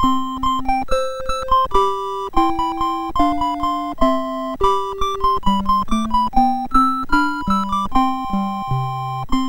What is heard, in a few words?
BASIC sid, MOS6581R4; Boccia